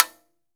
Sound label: L TIMBA, TIMBALES; AKAI S6000 CD-ROM - Volume 5